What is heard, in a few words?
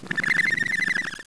hurt; Dink Smallwood HD